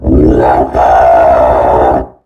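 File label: commando voice die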